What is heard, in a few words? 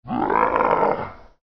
pig; Dice World